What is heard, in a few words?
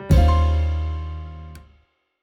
Jingle Trump Table Round Res Lose; Clubhouse Games: 51 Worldwide Classics